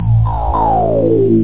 rev whop, 303

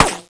metal, srifle; SINDEMO; weapons

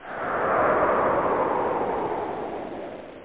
hall wind backward; The Haunted House 1.0

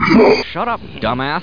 pain; butthead; soldier

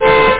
HONK, taxi

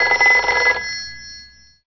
phone; BESTSHOT; noisybit

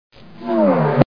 lander; How Many Bugs in a Box; juicebar

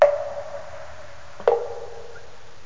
water torture (loop)

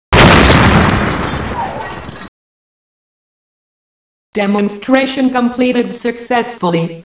crash; AIWars

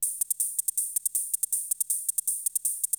DRUM LOOP ambient 160

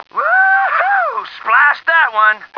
wohoo; stars; taunts

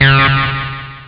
ACIDSYN, HARDCORE; Groovy Bytes: Behind the Moon; SYNTHIS

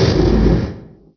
brake; PC Gamer IT CD 41

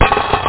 payout

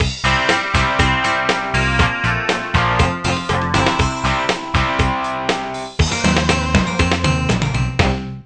jingle

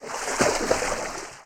FS Water; kult

